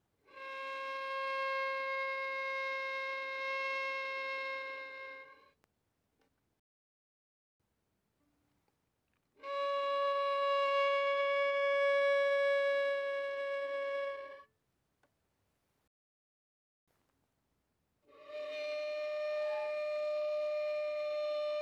Violin arco mf sul G C5 Gb5

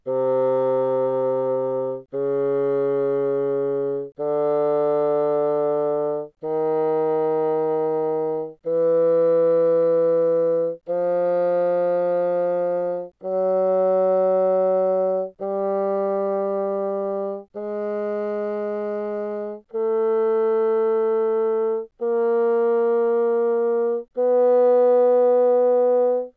Bassoon mf C3 B3

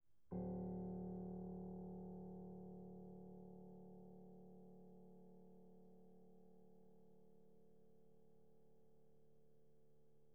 Piano pp D1

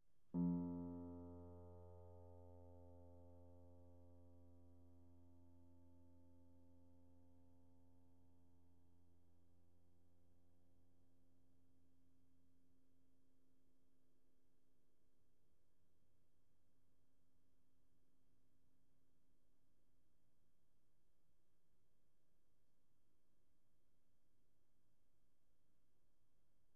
Piano pp F2

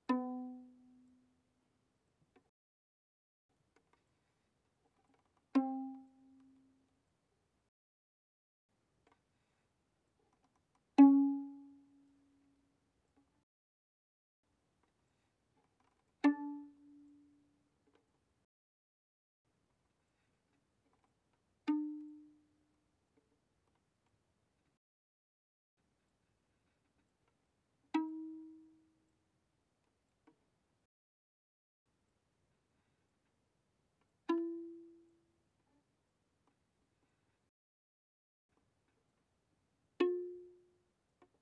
Violin pizz pp sul G C4 B4

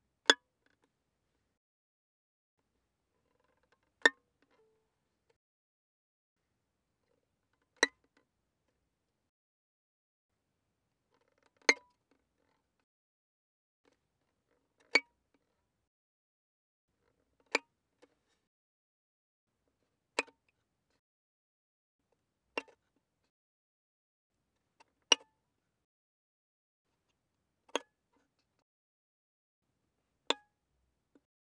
Violin pizz ff sul E C7 Bb7

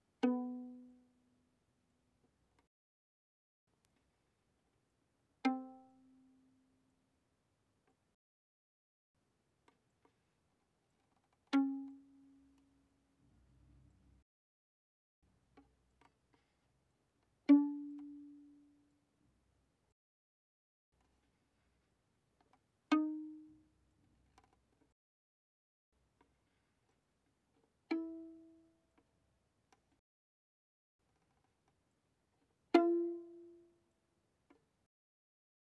Violin pizz mf sul G C4 B4

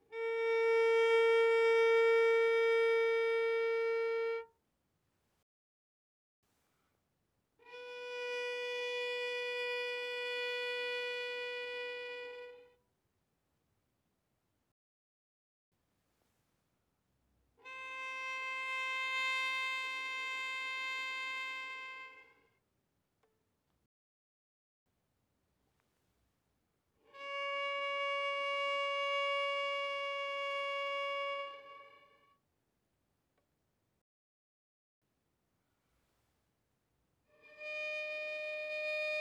Violin arco mf sul D C5 B5

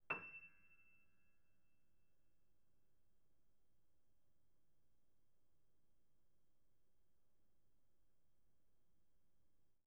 Piano mf E7

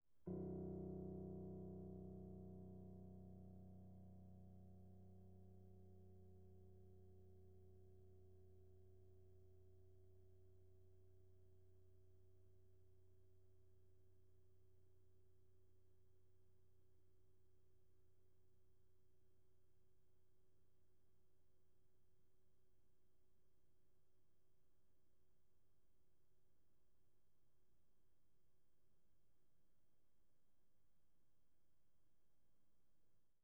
Piano pp C1

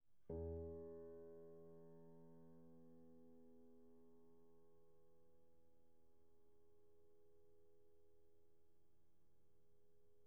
Piano pp E2

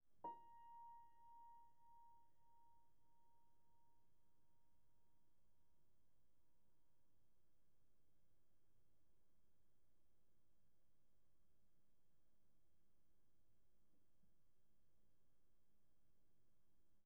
Piano pp Bb5

